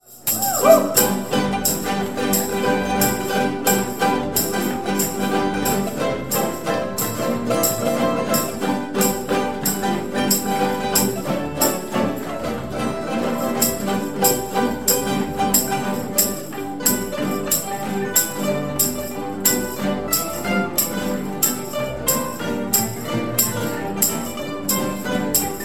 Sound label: rock'n roll live ende